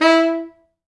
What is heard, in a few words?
E3, Pop Brass; Best Service ProSamples vol.19; AKAI